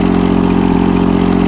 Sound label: generator running